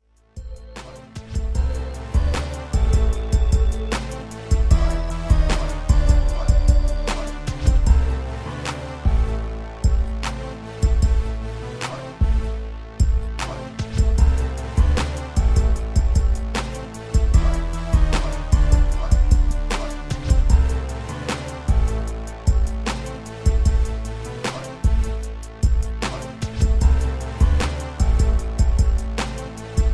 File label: 76BPM